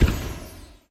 GEMS Gem

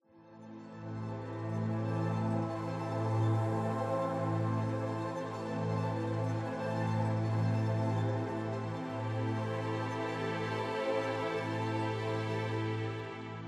Transition; Stage 01 Traversal

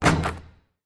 Score 3-Pointer; doodle jump 2; android

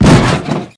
Impact

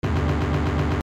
se dark; End of Aspiration; android

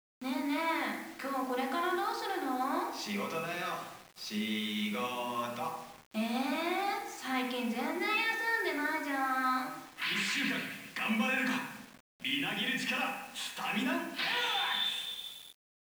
bgm karaoke; usen; yakuza ryu ga gotoku kiwami 2 pc gamerip

